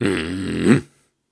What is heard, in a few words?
Snarls and Hums, Viking Vocal